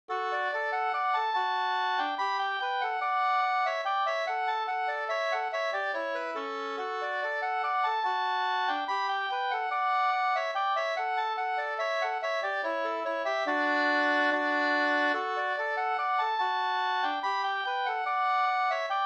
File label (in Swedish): skanklat